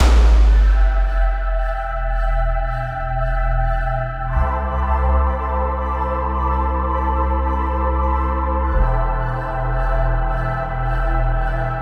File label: thrilling no drums